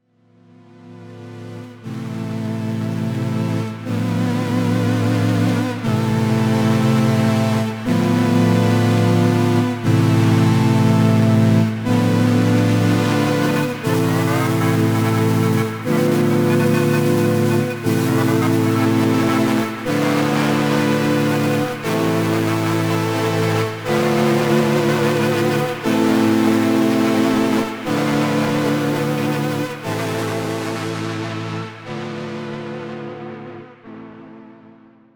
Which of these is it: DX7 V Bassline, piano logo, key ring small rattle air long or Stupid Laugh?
DX7 V Bassline